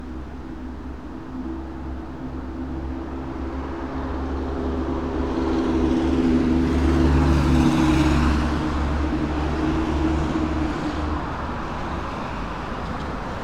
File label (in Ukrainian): Звук мопедика